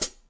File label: rs7000 hh cy b0, Yamaha RS7000 Cymbals; drum machines